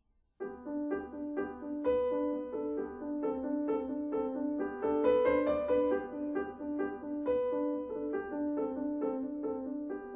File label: Swiss Music Box